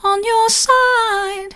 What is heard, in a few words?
soft, vocal